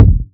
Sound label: bassy steel kick drum f sharp key; kick drum samples